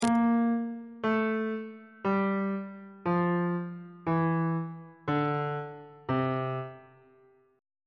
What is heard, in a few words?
b sharp mixolydian mode descending on bass clef